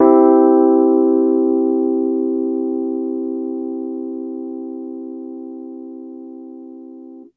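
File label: Piano Rhodes major med; TrashLibrary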